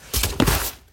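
short resonant sound of drawing a sword from its sheath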